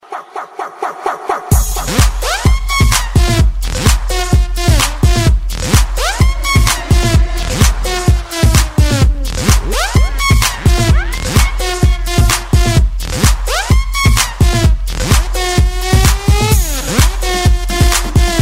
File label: cool dj effect, ringtone